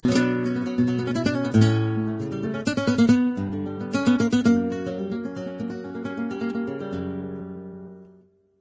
Mexican Music Intro; Diner Dash 2 Restaurant Rescue

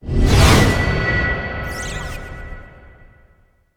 Field Mat Tap; Yu-Gi-Oh! Master Duel

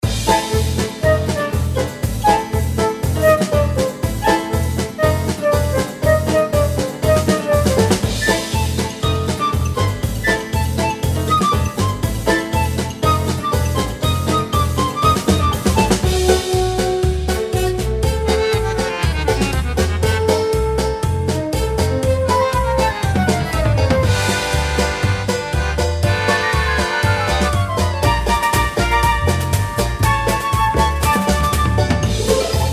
Music Game; Strikeforce Kitty

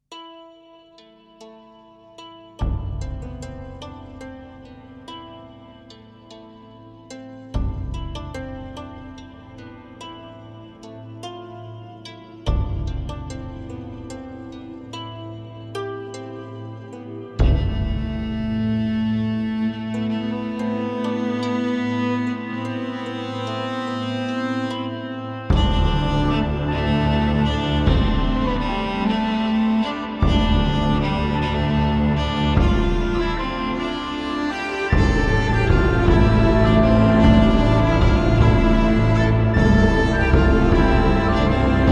Celtic Forest; Titan Quest Anniversary Edition

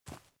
run grass

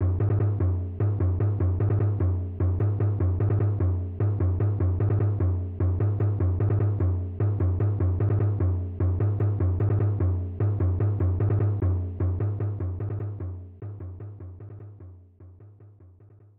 Jungle Rhythm; Pitfall The Mayan Adventure; SNES

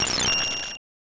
Crystal

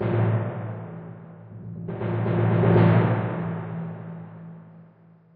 Tymp

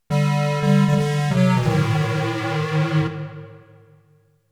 Bonus Game Defeat (SC-55); fatal fury king of fighters; x68000

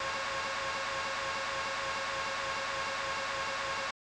Race Car SFX; Super F1 Hero; SNES

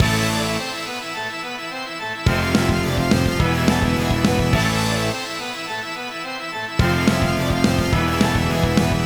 Battle Intro; magebuster amorous augury; android

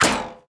Harpoon Hit Metal

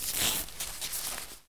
Healing

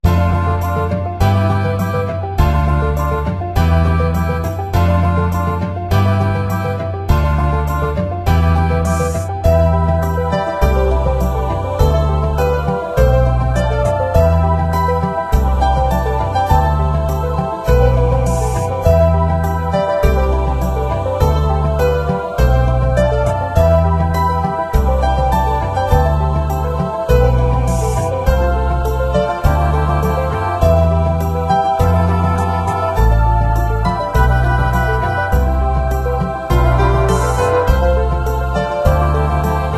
Dungeon; Adventure Bar Story; Android game music

